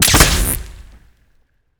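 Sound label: Weapon Pistol Alien Blaster Fire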